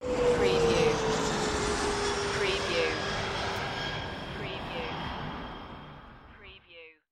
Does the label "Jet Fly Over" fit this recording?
Yes